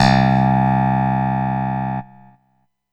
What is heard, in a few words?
Yamaha EX5 Stereo Clav C4